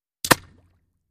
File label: Bullet Impacts Water